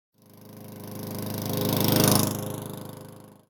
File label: tractor; Blind Drive